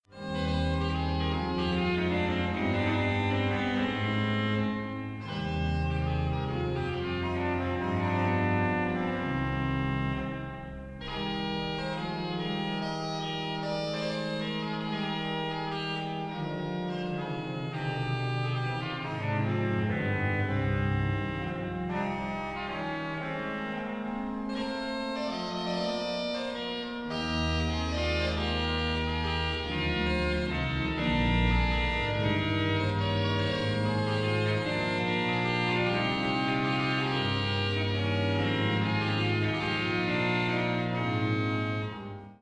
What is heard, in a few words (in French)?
Trompette Hautbois, orgue